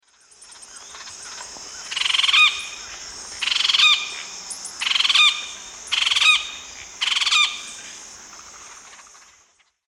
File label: Melanerpes cruentatus, call; S. America; Eastern Lowlands